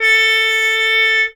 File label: Horns